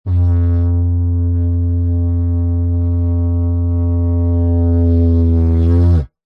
foley blowing into plastic pipe vibrate resonated didgeridoo like